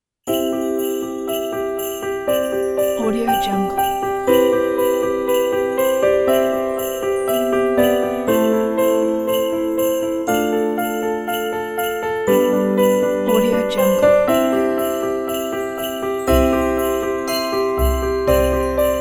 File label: christmas morning